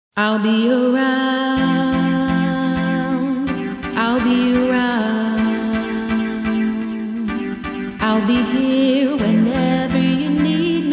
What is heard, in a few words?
Disco Chill Vibes